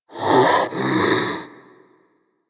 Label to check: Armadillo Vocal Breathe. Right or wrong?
Right